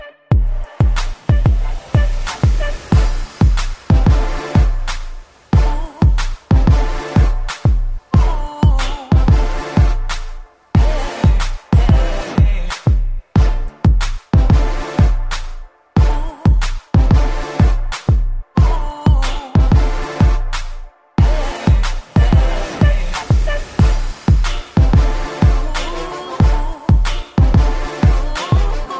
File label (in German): trompete